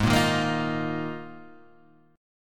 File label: A sharp 7th Suspended 4th G sharp, Guitar